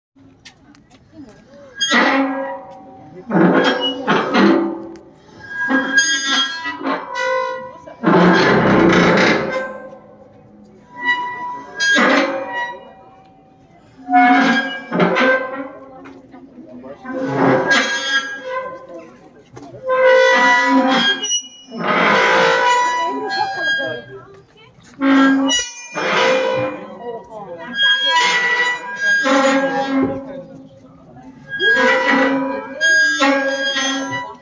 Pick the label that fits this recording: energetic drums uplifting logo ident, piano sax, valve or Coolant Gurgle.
valve